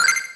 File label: coin; godot; platformer